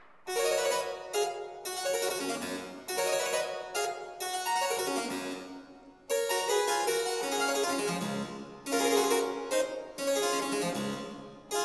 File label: Sonata in G major; J S Bach; Goldberg Variations